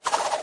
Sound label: shuriken